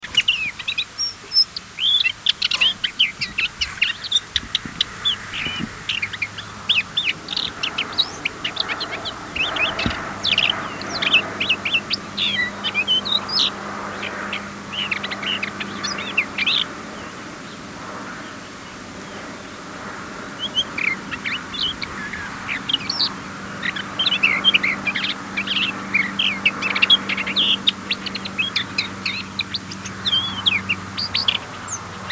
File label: Desert Lesser Whitethroat song; Oshayqer KSA; AbdulRahman Al-Sirhan